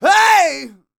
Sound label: R ROCK, MALE HUMAN; R&B & Dance; ILIO; Vocal Planet